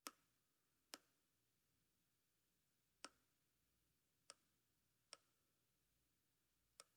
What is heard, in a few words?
Cell phone taps; Fairlight Sound Lib